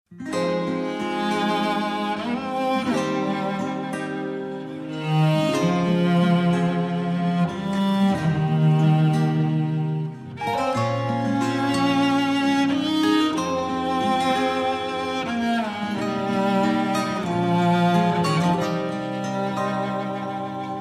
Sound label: Painful Music